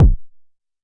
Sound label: Jomox MBase, drum machine; Jomox MBase 01